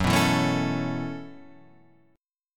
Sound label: B 7th Suspended 2nd Suspended 4th, chord